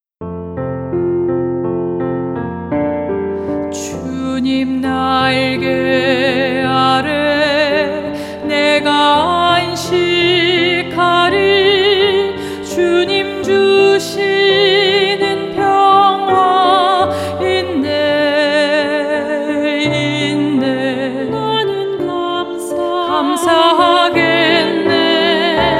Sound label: ALTO